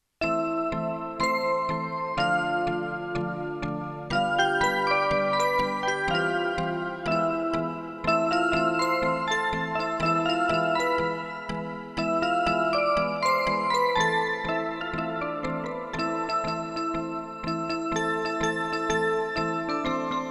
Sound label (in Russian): Мелодия на часы